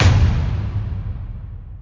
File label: drumbeat